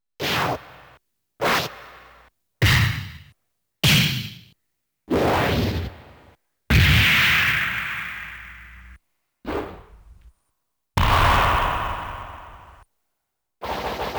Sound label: Character S.E.; Art of Fighting 2